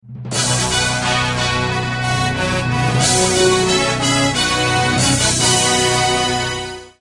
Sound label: Coming Soon Tone Alert Tone